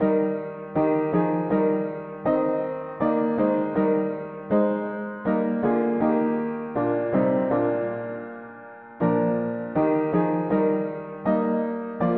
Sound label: mono piano, hymns